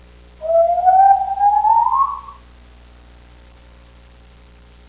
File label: gibbon